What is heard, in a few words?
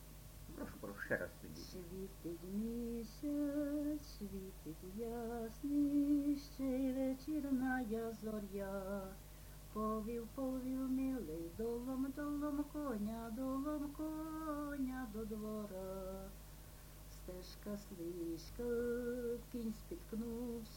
svityt misyats